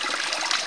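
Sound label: fountain water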